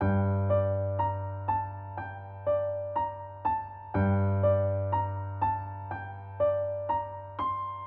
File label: Gm 122bpm keys; Trench Baby Vol5